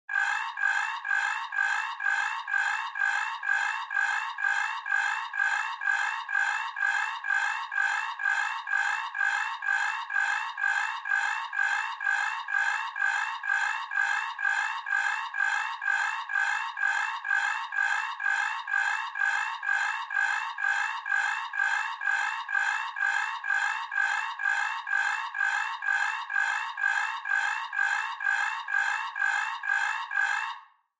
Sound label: Alarm Nuclear Meltd